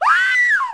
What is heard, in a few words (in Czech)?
Výkřik polekaný